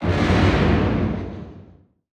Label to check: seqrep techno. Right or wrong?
Wrong